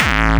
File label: BD Distortion, Bassdrums; Vengeance Samples